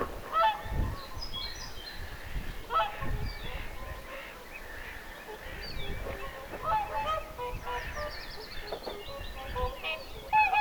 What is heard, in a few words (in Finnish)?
laulujoutsenten aantelya kesalla ilmeisten nuorten rannalla